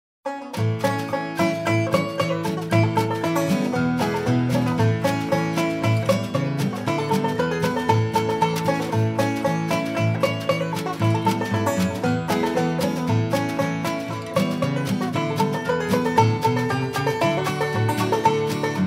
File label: Folk